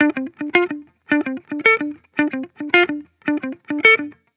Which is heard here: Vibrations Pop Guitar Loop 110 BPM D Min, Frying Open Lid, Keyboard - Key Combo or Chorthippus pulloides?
Vibrations Pop Guitar Loop 110 BPM D Min